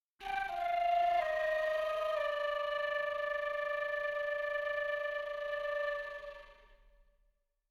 Deep Hole Dive

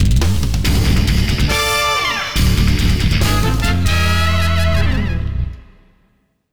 Machine Gun Mission Theme; blitz brigade; android

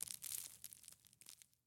Waste Event One Shot; Puddle

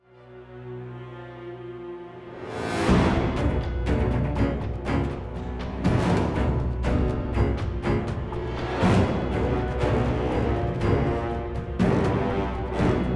Battle Theme; Shadow-Cursed Lands

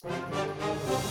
Music Main 108bpm; Act Roma Amorce

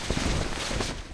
Clamp

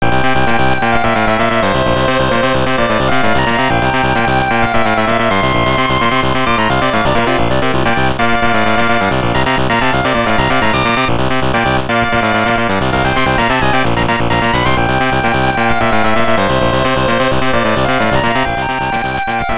Course Victory, Podium BGM; World Circuit Series; GB; YUSHO

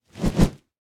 Punch pig Sequencia; Super Mombo Quest

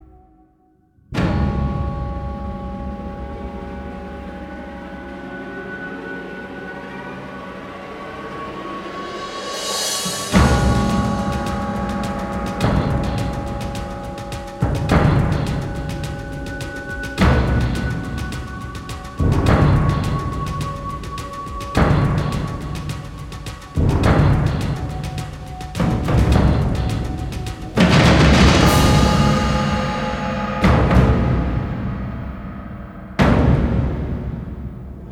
Battle C#m 105bpm; disney infinity 2.0 marvel super heroes gamerip

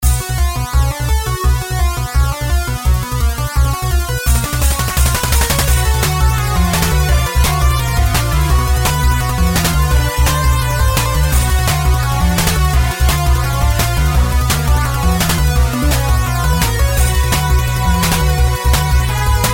Deadly Robot (Boss-Action 90s); singled out

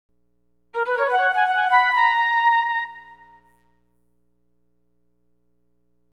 Flute; Leaf Early Collection

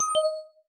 clown bowling strafe; Ringling Bros. and Barnum & Bailey; Wii

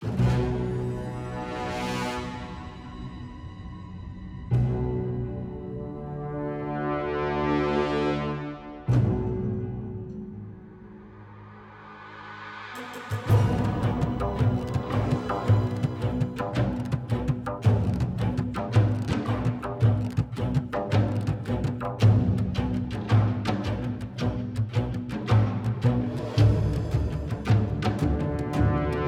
Instrumental Variant; Twisted Force

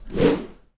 Lane arrow Sfx

